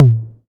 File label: Tom Low Deep End; PUNK VS WOLF